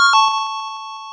Unused Jingle; Kids Communication Pico

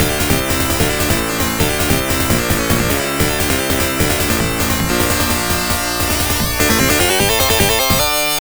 Victory; James Buster Douglas Knockout Boxing; Mega Drive

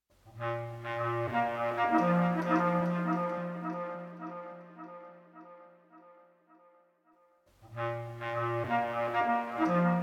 Unique Flower Clarinet; Proteus

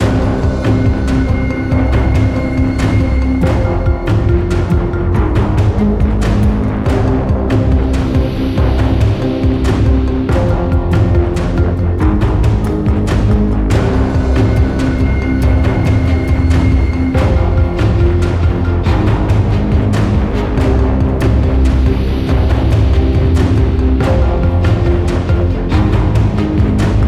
Combat Alt Loop; Fortnite Save the World

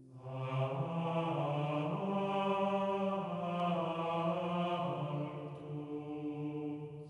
harper vocal; dragon riders chronicles of pern 2001 dreamcast gamerip

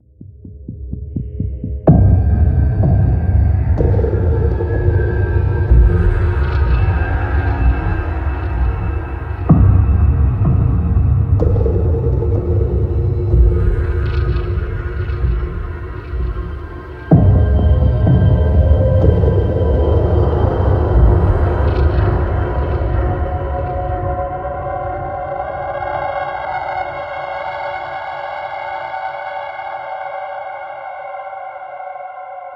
Sneak

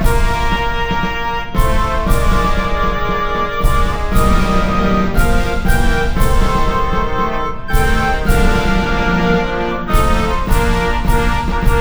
Anthem Francais

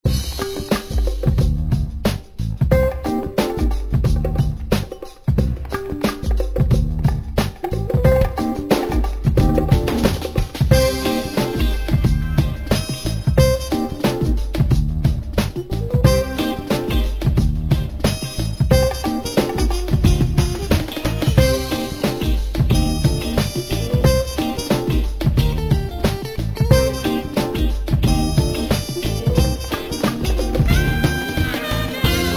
Random Level; Sheep Raiders

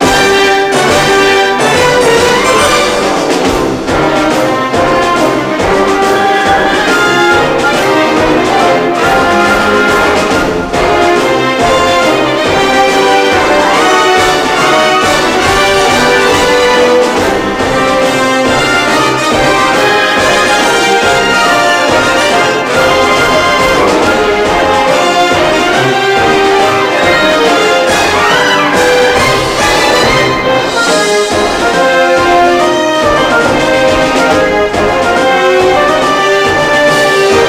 March, No Bell; the wonderful 101; Punch Punch March (No Bell SFX)

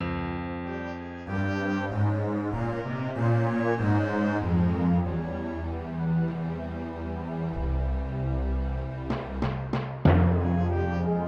Death; Ultima Underworld

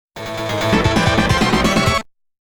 Round Clear; Legend of Joe Musashi Shinobi Music Collection

